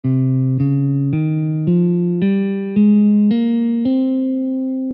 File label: scale; scales of India